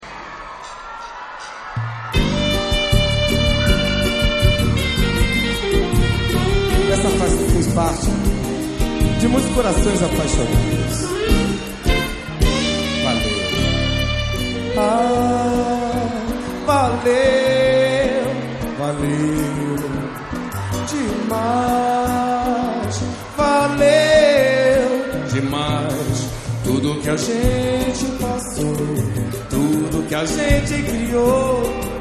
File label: samba; samba pagode